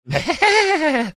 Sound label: evil laugh